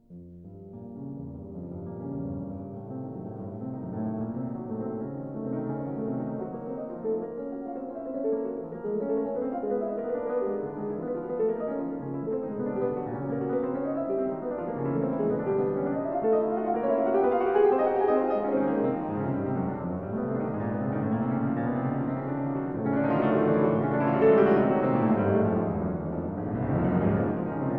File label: piano sonata no 2 in b moll presto